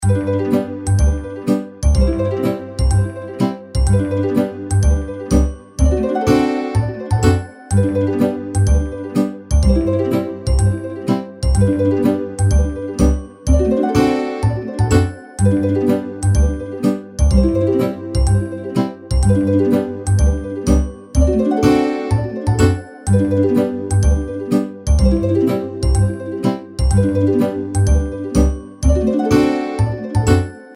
farm bgm; games; Farm Mania; Happy Farm

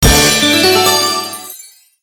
free spin init; Royal Unicorn

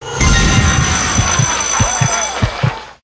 bigwin; Bells On Fire Hot

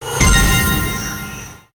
nicewin; Magic Owl; slot

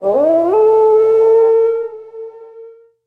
spin scatters all; In The Forest; Wazdan

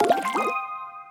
win multiline; Hungry Shark; Wazdan

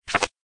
GAMBLE LOSS; Helena

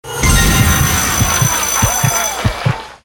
bigwin; Wild7

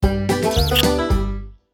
symbol scatter; Wild Guns

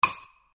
reelspin click; CaiYuanGuangJin

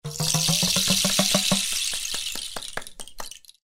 polynesian intro sfx

